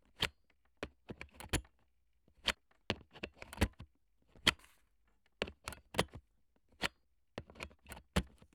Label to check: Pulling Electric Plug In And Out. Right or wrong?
Right